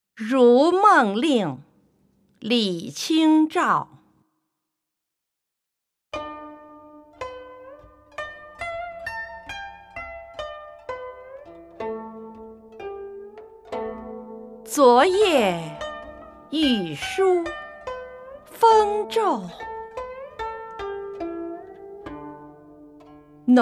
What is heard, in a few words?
music; ru meng ling